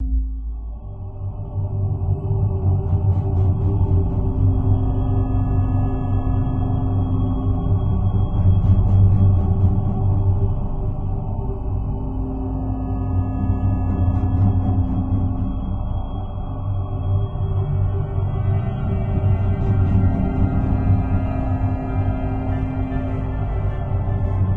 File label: space, Ambience